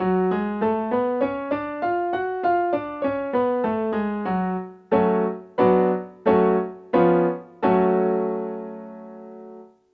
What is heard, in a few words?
F sharp harmonic minor scale and primary chords